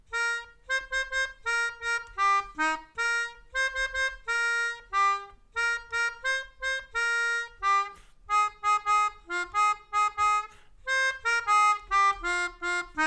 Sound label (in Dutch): 2 emmertjes water halen, Melodica